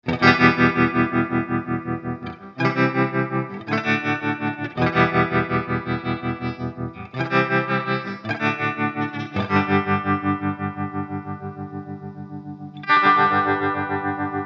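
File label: Ernie Ball Expression Tremolo Sinus clean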